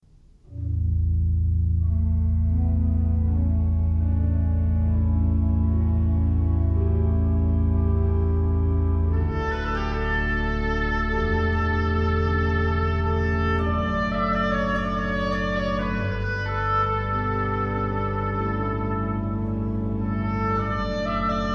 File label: Oboe